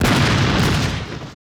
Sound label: SHOT SEQ; Total Control - Action Cutz